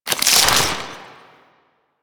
Crystal Growths Attach; Warframe; Citrine

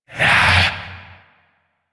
Possessed Vocal Attack; Warframe; Priest Quest; Possessed Red Veil B

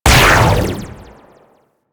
Amalgam Sniper Clone Ability Hit; Warframe; Abilities

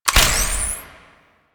Pistol Ready; Drifter; Warframe; Quests; The New War; Narmer Outpost

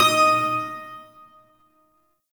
Shawzin Oct Three D Sharp; Warframe; Duviri Shawzin

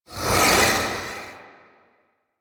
Archon Whip Ranged Attack Projectile; Enemies; Weapons; Warframe; The New War; Nira

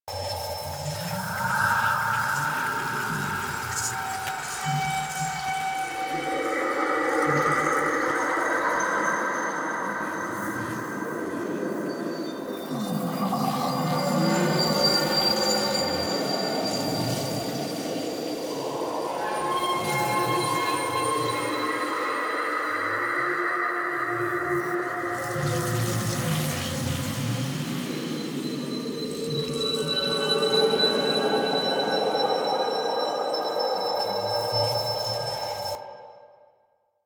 Ambience Loop, Interior; Warframe; The New War; Zariman

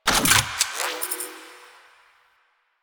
Reload, Weapons; Warframe; Acceltra Prime

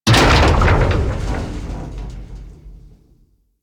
Ship Hull Impact Large; Hit Effects; Warframe; Railjack Hull Hit Effects

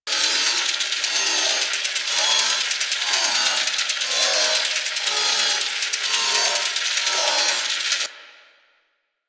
Ship Shield Pillar Loop; Warframe; Corpus Ship

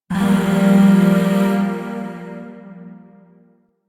Zariman Void Shawzin Phrygian Chord

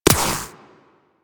Shield Impact

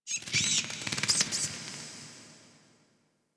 Interior Cave Bat; Warframe; Ambience; Duviri

